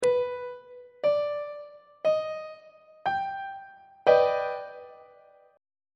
e flat augmented major 7th 2nd inversion on treble clef